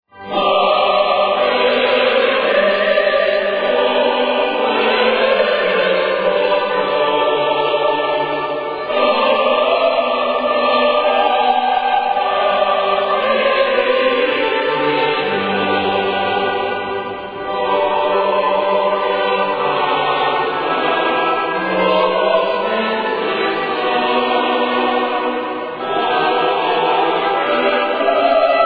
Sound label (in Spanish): ha venido haendel octeto; Ha venido – Haendel2